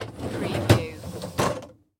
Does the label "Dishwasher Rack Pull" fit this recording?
Yes